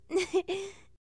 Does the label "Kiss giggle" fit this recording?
Yes